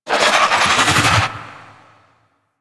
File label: Fairy Light Cast; DE; Warframe